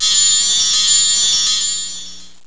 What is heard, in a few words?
sparkles; qate unidentified